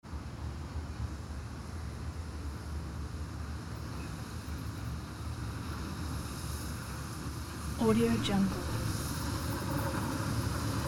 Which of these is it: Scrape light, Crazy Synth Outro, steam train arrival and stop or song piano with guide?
steam train arrival and stop